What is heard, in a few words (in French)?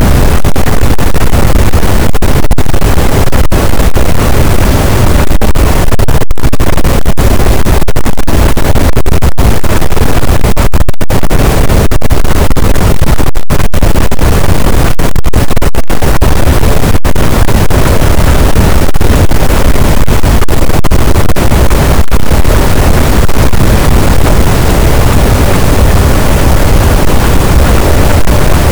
cachalot, gaussian noise; Physeter Macrocephalus